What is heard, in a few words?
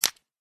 ignite, fire; Eaglercraft; eaglercraft-1.5